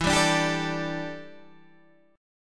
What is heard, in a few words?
E Minor 11th E down Guitar Standard